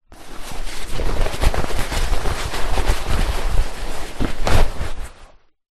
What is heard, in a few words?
wetsuit movements; foley